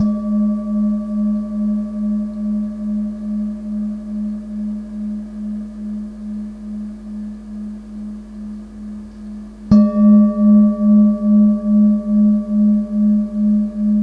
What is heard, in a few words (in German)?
klangschale mittel